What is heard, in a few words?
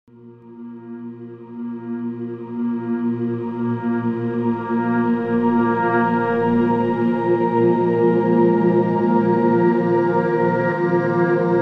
biohazard vgm ambience